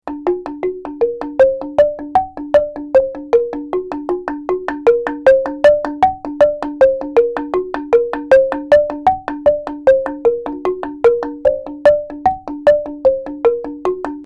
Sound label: gamalan bamboo sequence